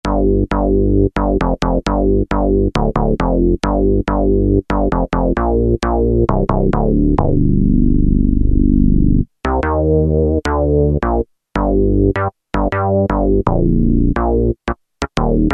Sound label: BASS